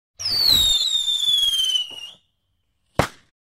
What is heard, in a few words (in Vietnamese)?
am thanh ten lua bay va roi vui nhon